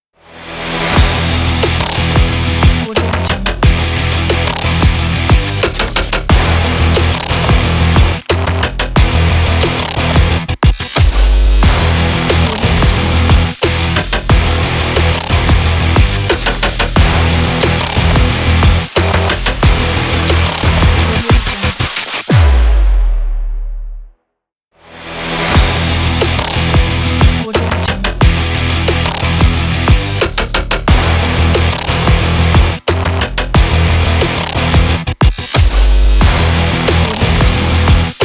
action rock glitch logo

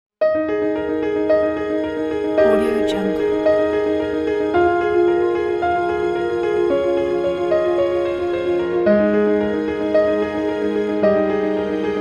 nice piano cello and orchestra melody

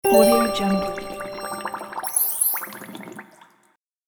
magic potion drink

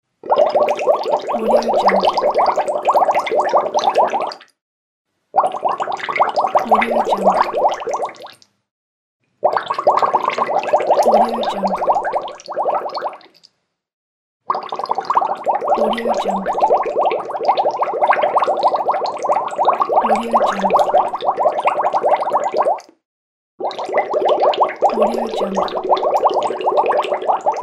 bubbles

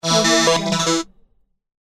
robot fast data read

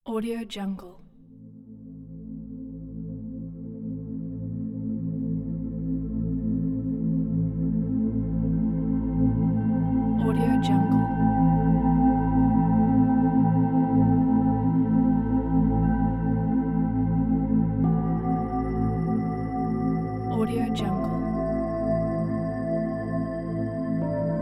air bells meditation

